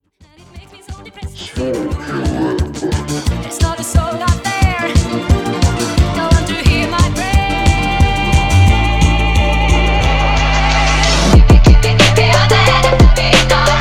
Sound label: PHONK